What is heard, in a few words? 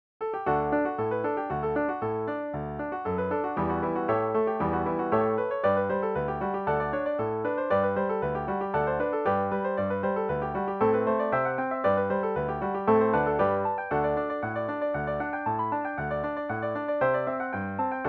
Chorus Jig